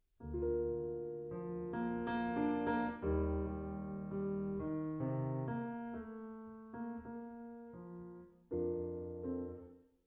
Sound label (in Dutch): In meines Herzens Grunde tenor zang